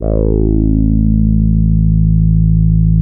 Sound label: TAURUS C3, synthesizer